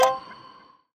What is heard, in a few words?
clock go